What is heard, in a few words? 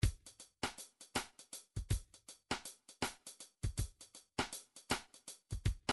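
drums side stick, midi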